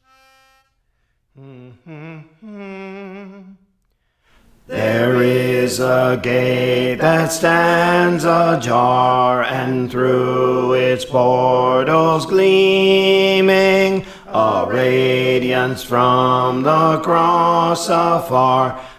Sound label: Bass; Praise For The Lord Training